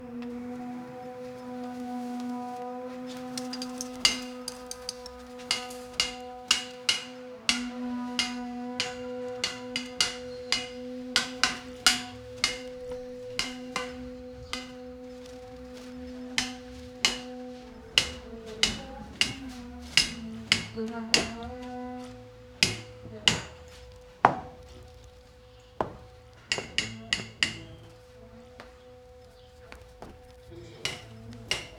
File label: medieval construction sou